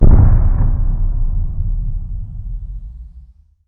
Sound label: distant bomb explosion